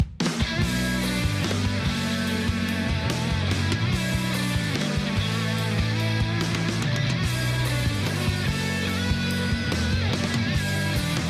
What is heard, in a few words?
Rock Ver; Angel Beats